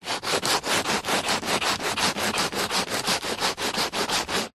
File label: industrial sanding large piece of wood sand paper med